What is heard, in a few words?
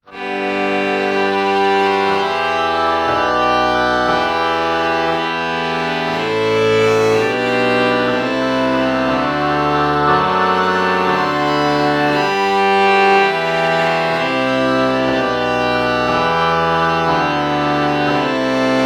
oboe violin morph